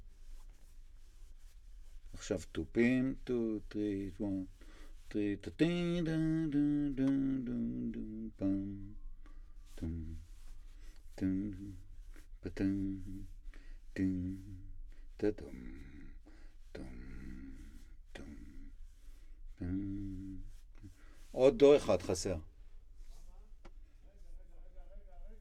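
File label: Contrabass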